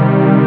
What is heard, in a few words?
strings min